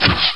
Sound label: ARROW; Caesar III